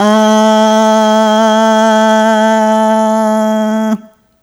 Rhythmic A

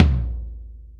gretsch tom, deep house single hits

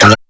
NOISE; AMG - Vince Clarke Lucky Bastard

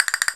Castanet Roll; Digital Sound Factory Virtuoso

